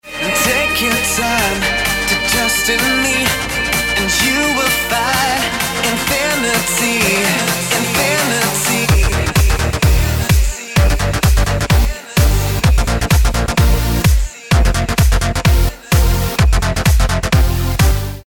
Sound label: BEAT